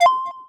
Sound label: Motion Design Button Tone; SoundsCrate